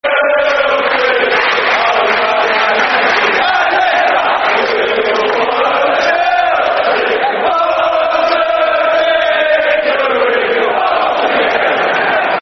We All Follow The Chelsea, Football Chants (Soccer Song)